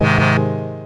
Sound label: organ; Goldwave